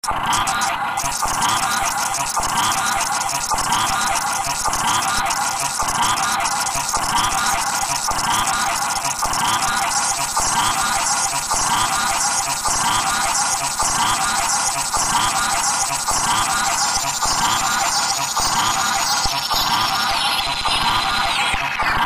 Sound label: clicky scissors